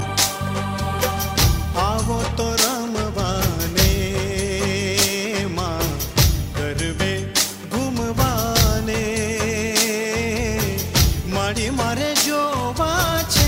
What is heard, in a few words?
Garba Rhythm